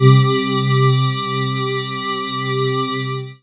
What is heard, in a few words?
Synths Note C